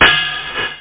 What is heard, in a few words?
pling; ActionCat; jet session fx